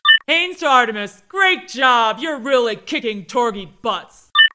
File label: kick; Haynes; Artemis 2.7; HamakSector